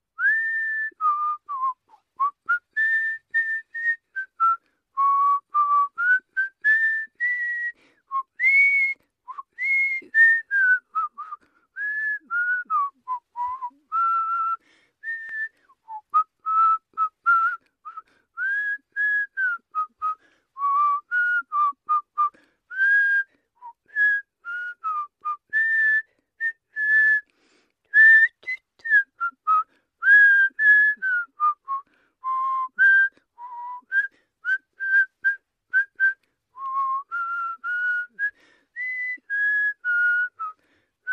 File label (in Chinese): 女性自由开心吹口哨的声音